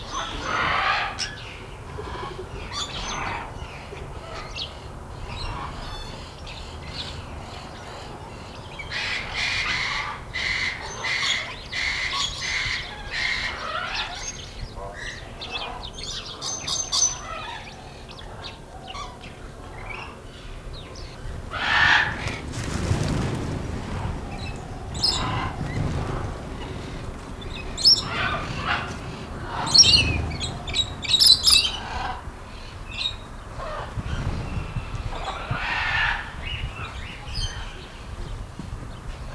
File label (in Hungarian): madarak verebek felrebbenése egyveleg szélvédő; állathangok; nyíregyházi zoo 2010